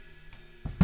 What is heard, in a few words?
SNR Top